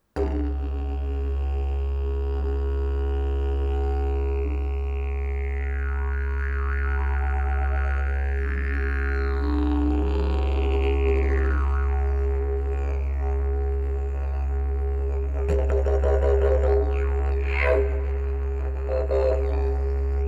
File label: didge